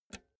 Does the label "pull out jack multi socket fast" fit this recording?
Yes